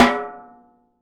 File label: LUDWIG SNARE